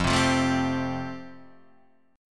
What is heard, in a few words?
F Major Add 9th, Guitar Half Step Down